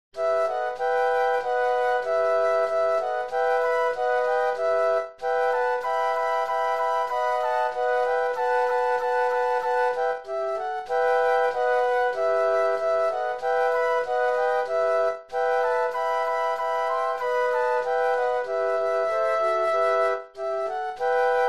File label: flute quartet